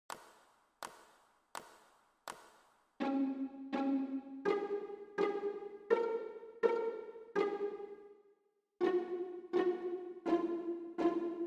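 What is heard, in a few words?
violin viola pizz; twinkle little star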